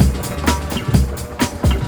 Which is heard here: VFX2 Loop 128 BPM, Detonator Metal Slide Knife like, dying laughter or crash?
VFX2 Loop 128 BPM